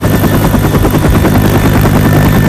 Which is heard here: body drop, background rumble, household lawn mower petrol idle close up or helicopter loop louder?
helicopter loop louder